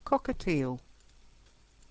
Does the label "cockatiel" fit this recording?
Yes